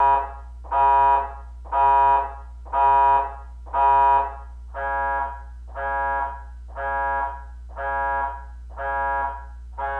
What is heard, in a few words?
Horn Intermittent